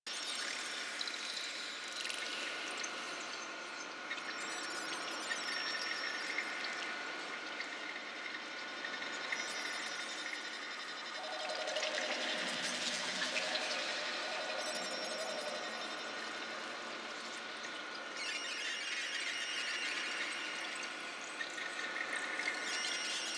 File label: Messenger Ambient Loop; Ambience; Warframe; Nineteen Ninety Nine